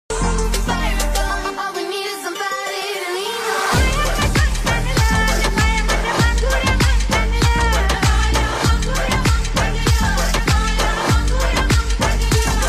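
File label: song marathi background music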